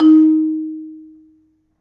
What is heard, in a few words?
Marimba, D#2; Terkelsen